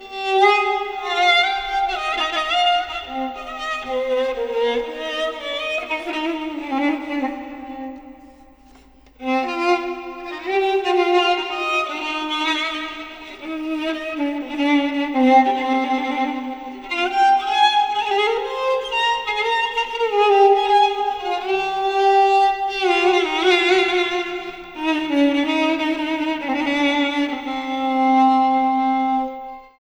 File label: viola c